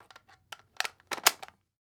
Weapon Gun Machine Gun Thompson Sub Clip Insertion; AdobeCC